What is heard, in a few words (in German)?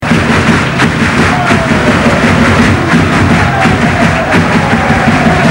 Klatschen